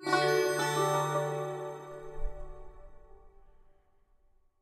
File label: splash Screen Continue; wild bazaar